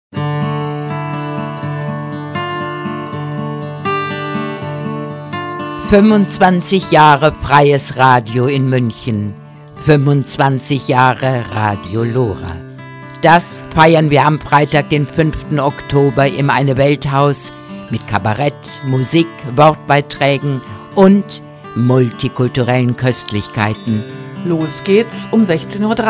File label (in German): Jingle; 25 Jahre LORA